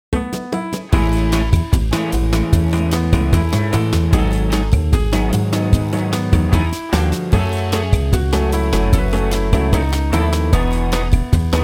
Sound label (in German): Fahrstuhlmusik